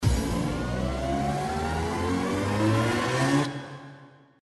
anticipation bonus; Monkey Spin

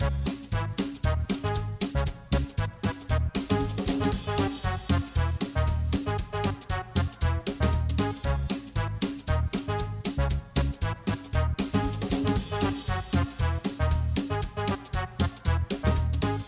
BGM FREESPIN; Monster Chef

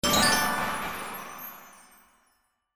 Reg Symbol Wins; slots; Crazy Rockets

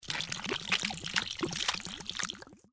Bonus Pour Gravy; St Paddys Day Blast

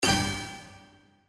reel spin stop; Outdoor America

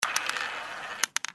Dispensing gumball animation; Sugar Town

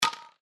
Draw Button, video poker

